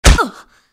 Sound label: hit, female; csco; csgo; zbm3